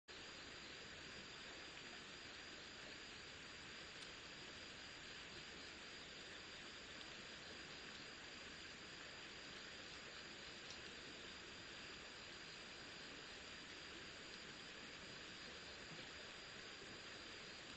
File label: riverside; Kyoto U Ashiu riverside